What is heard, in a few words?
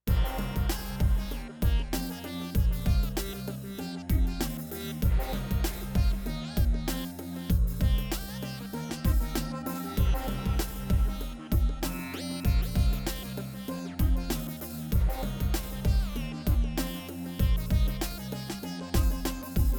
ECC83S Tubes